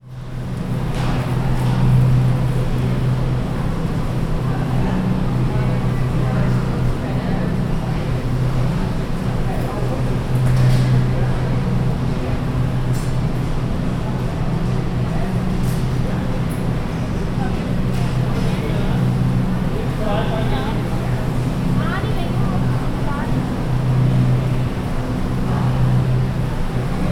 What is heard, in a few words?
drone